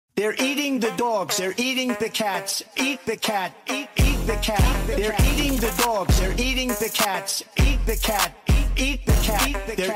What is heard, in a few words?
remix; trump eating cats dogs